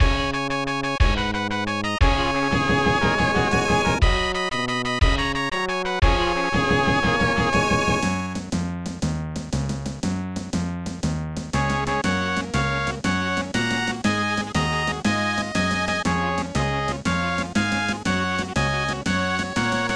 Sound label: Game Start; dolucky's kusa yakyu; snes